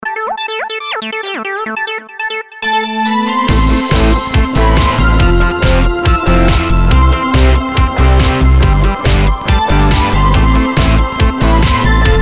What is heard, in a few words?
Guitar Mix